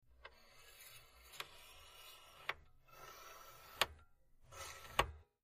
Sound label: Antenna; Telescoping Antenna Up And Down, Slow.